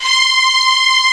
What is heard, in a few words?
hi string; Descent